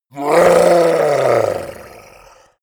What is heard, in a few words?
yeti growl angry command; kreaturen